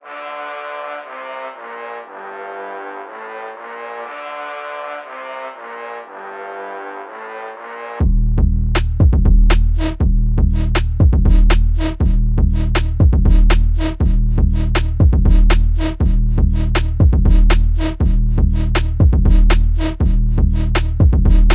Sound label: uk bass